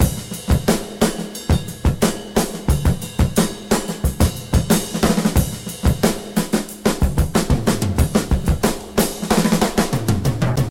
90 bpm modern breakbeat f key, kick tuned to the F note